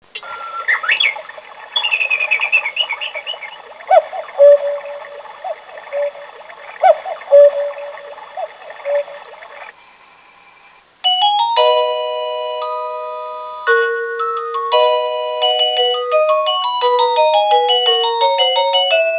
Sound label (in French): quartz musique, coucou